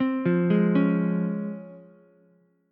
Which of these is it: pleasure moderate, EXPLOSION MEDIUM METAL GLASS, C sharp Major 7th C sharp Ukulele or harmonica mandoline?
C sharp Major 7th C sharp Ukulele